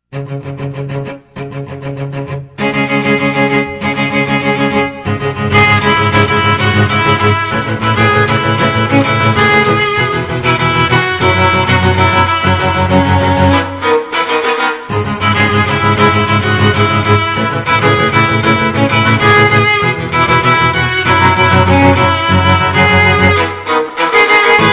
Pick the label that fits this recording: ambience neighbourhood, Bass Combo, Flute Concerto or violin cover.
violin cover